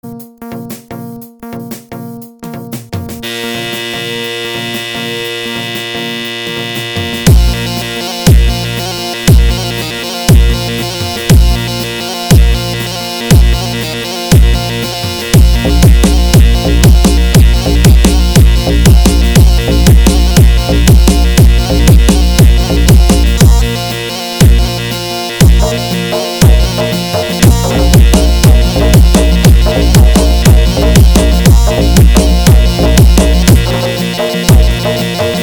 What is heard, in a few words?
Arabic Bass Vibre